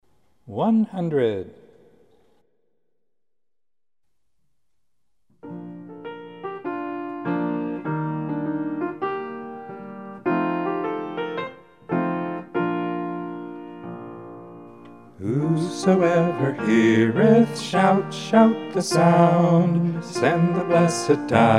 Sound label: Hymn